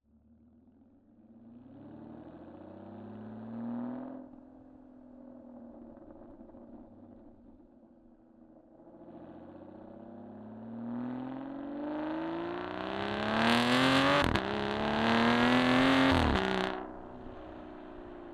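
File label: Aston Martin Rapide S Onboard Medium Drive Various Stop Off Exhaust Left; DPA4062; Pole Position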